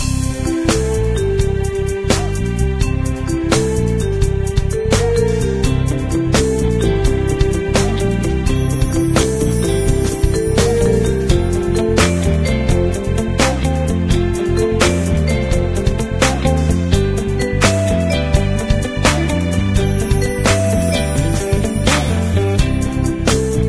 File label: Main menu music; Diner Dash 2 Restaurant Rescue